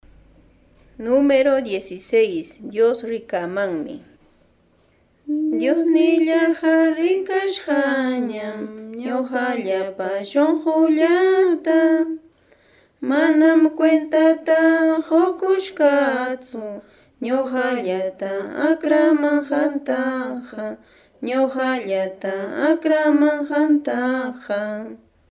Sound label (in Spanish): cancion